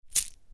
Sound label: Coins; ALICE BLUE; competition2019